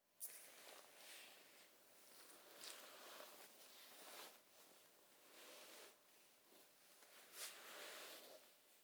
衣擦れ左から正面へ

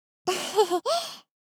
えへへっ♪ 少女×中音域

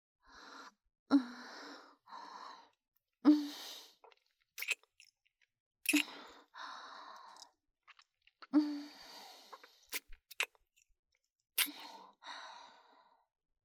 吐息たっぷり大人のキス, ASMR

